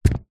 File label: container with dry rice inside set down on wooden counter; zapsplat; foley